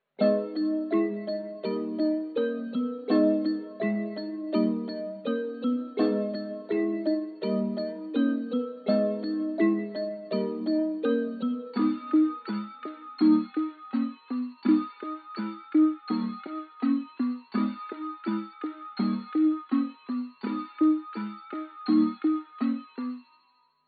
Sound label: 166 BPM G# Min Bells; Melodies; Loop Stems; Cymatics; Goosebumps; Pharaoh Premium Drum Samples (BETA)